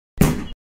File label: box punch; jailbreak